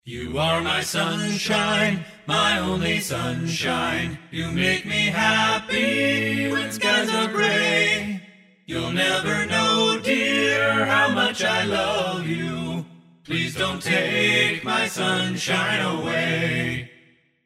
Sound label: Barbershop; Soundiron Questionably Barbershop